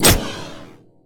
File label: auto fire; smite; Apollo